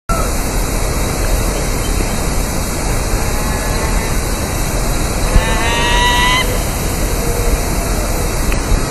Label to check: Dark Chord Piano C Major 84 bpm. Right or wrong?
Wrong